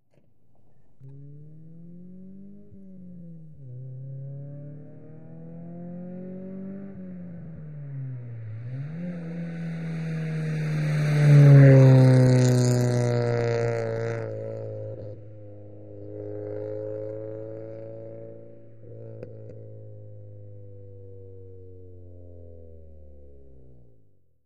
Integra, long distant approach, medium fast by with decelerate, Acura Integra